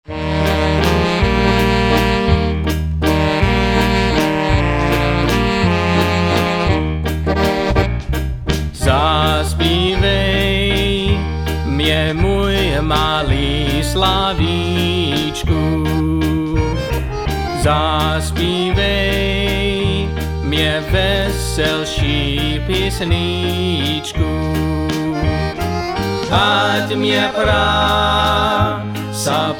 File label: Waltz